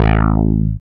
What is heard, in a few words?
BAS, Eurotech; Sounds Good